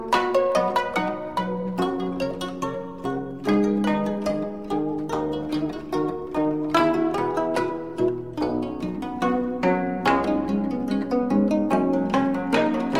Canon in D on guzheng

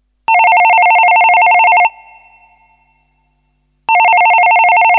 phone ring

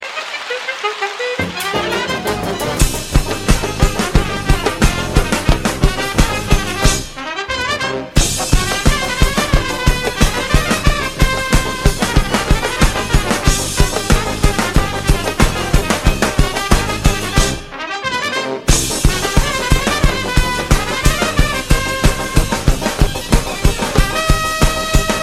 Moldavian fanfare

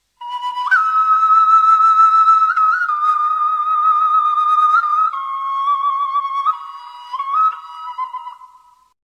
armenian flute

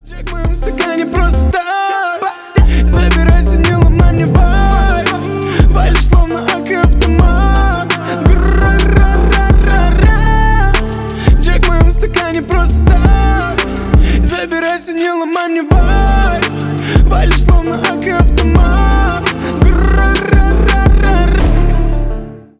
ak 47

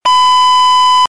text tone

